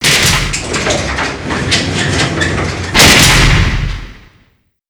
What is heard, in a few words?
jaildoor open; Call of Duty Modern Warfare